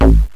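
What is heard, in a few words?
FM Moog Thud Bass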